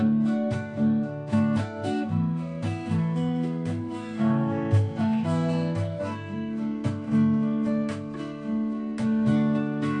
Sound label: acoustic guitar